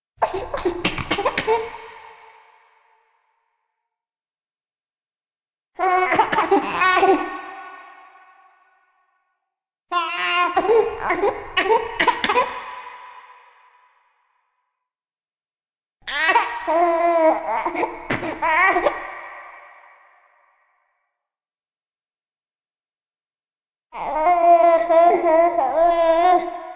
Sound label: Baby Upset Loop; gameplay; Warframe; Jade Shadows